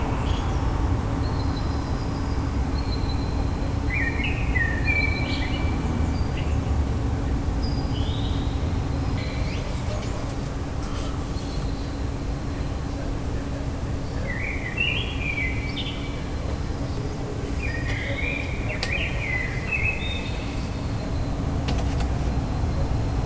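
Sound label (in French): Merle Mesange Charbonnière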